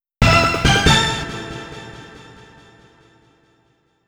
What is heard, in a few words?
ramp open; ksl